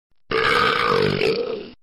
burp; taunt packs; classic

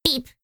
boop; tf; a hat in time; hat kid

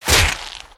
crossbow hit

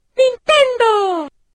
jump; freak fortress 2; mario

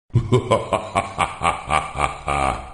muahaha

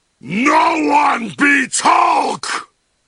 win beats; superheros; the hulk

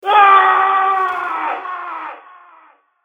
scream

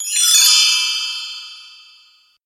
escape chimes; tf; axel nosferatu

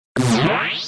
blaster; UT

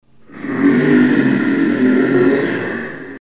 zombie; dod

